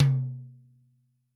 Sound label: Toms, Yamaha RY20